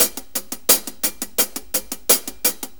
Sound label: 4-4 HiHat Loop; Sonic Foundry; Drum Tools